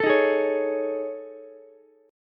A Major 7th Flat 5th, Ukulele; strummed

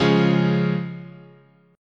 C sharp Major, chord

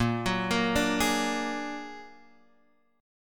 A sharp Augmented 7th A sharp Guitar Standard; A#+7 arpeggiated; JGuitar

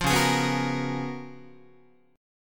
Db 13th Guitar, Db13 chord; strummed; JGuitar

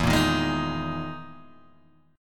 E Major 7th Flat 5th down Guitar Open G

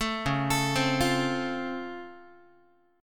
Db Augmented Major 7th, down, Guitar; arpeggiated; JGuitar

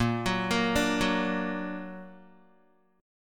Bb Augmented Bb, Guitar; arpeggiated; JGuitar

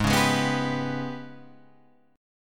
G sharp Minor Major 7th Double Flat 5th; x,11,11,13,11,14,13,x

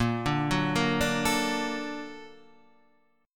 Bb Augmented Major 7th Bb, Guitar Standard; Bb+M7 arpeggiated; JGuitar

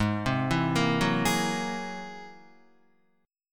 G sharp Augmented 9th, Guitar; arpeggiated; 4F,4A,5C,5G,5C,6E; JGuitar

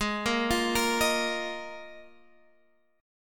Eb Suspended 2nd Suspended 4th Ab

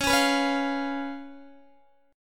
Db 7th Db down Guitar; Db7 strummed; tuning=4F,4A,5C,5G,5C,6E

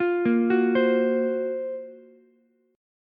F sharp Major Sharp 11th F sharp Ukulele C6; arpeggiated; JGuitar

